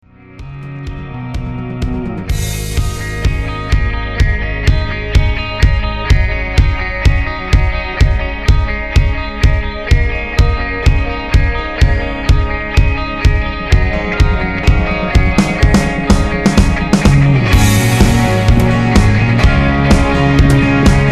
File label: goldtop